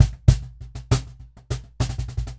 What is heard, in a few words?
Cajon Loop 100BPM; musicradar